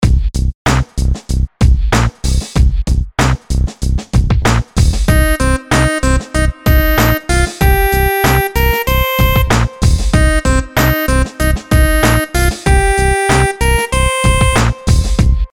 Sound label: rhythms dotted eighths; simple dotted 4s